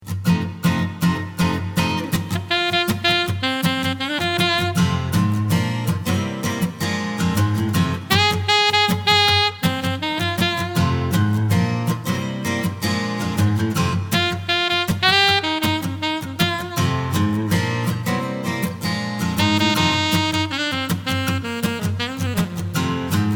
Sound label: happy Saxophone duo; merseyside